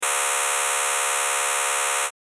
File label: unanalyzable, saw; sfxr